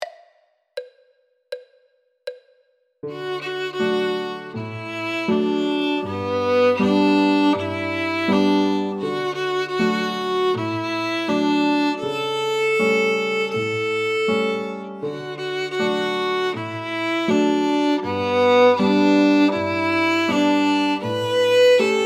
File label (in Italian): violino e pianoforte